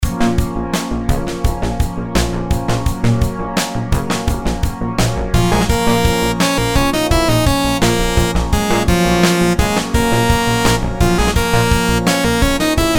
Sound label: rhythms simple dotted 8s 85